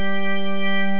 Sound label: doppler down